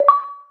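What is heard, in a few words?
Achievement Notify Popup, notifications; Microsoft Lumia-550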